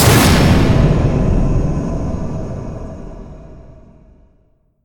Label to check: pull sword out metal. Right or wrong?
Right